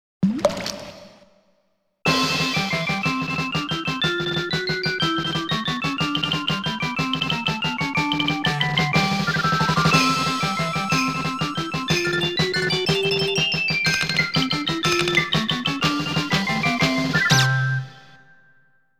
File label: Insert Coin ~ tiny fanfare; Space Gun Mizubaku Great Adventure